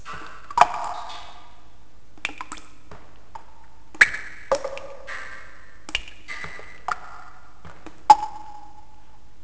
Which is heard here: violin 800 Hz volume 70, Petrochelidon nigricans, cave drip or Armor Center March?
cave drip